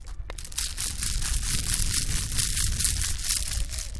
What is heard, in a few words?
stones